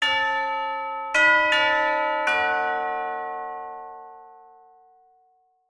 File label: Tubular Doorbell